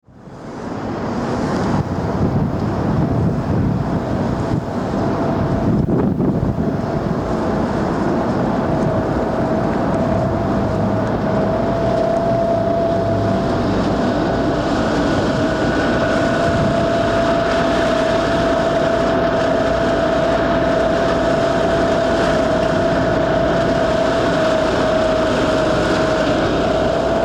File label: financial district